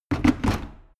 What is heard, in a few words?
plastic water boiler place hard